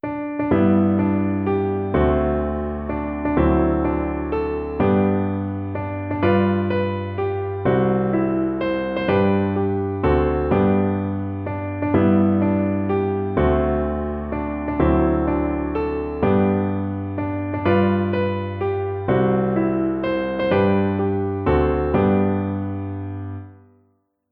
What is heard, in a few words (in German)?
Happy Birthday Geburtstag In G, Klaviervariante; G-Dur